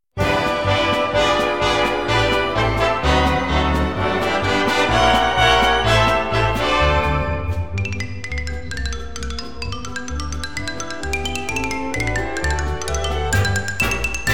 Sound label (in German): Palisander Xylophon Solo